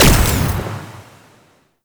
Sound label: Shield Deactivate; Warframe; Nineteeen Ninety Nine; PvPvE